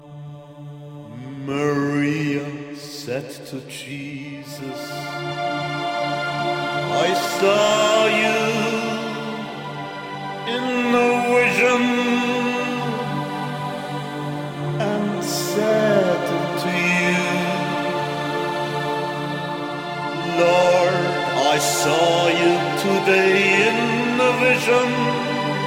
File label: Second Chant